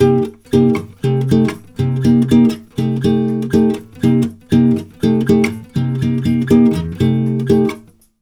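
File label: 120 guitar C minor, Brazil Bossa; USB Soundscan; AKAI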